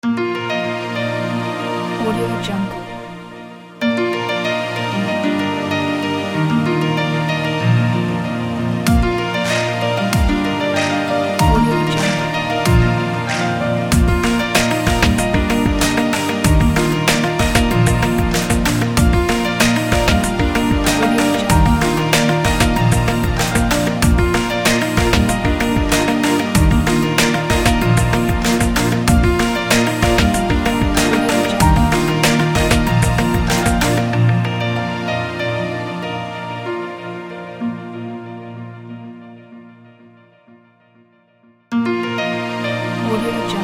positive piano

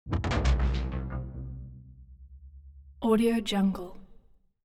ui sci fi alien splash impact dark

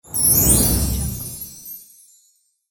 magic whoosh

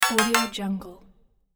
triple stab deny button

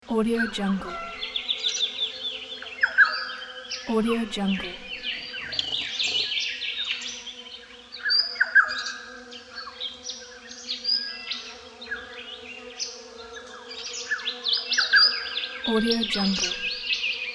tropical forest

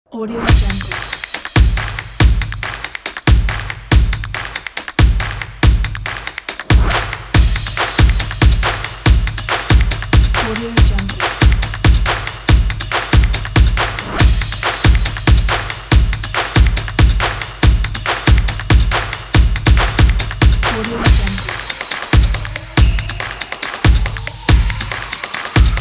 fashion stomps percussion

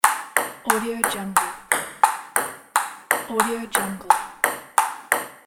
ping pong

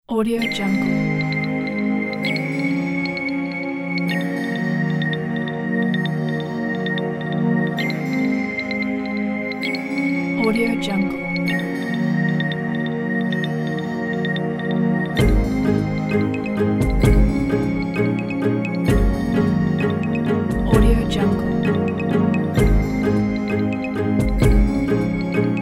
uplifting pop upbeat

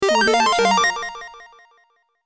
retro 8bit video game success sound

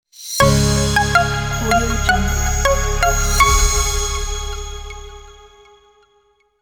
short media logo

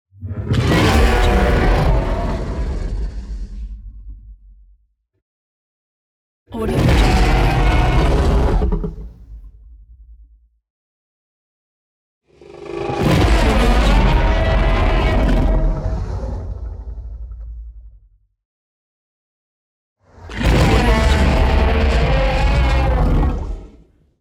trex

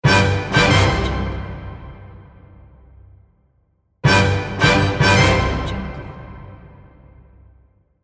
winning fanfares; kolokol

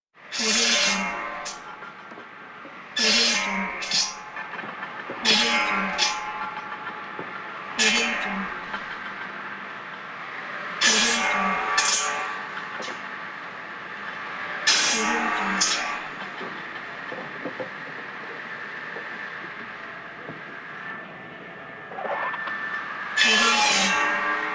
lumber mill general ambience

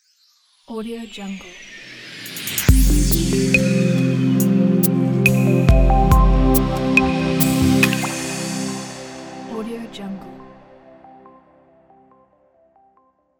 hitech innovation logo

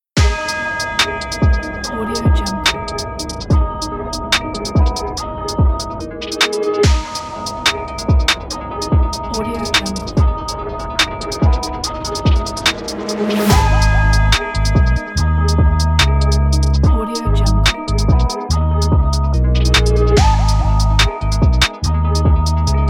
drill hip hop intro logo

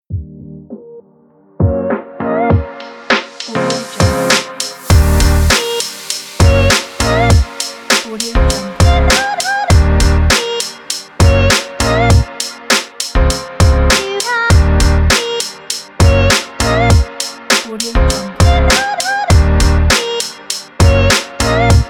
hip hop; phoenix-sound

